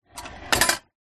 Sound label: household popcorn maker machine metal kettle flip turn to empty